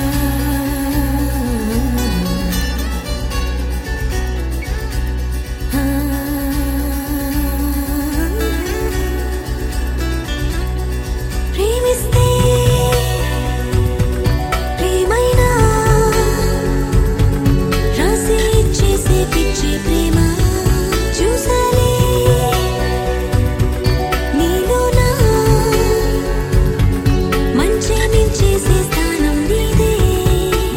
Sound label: Ringtone Female